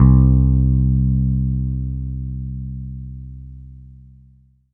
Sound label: Korg 01W Bass C2